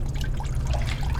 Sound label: BLIP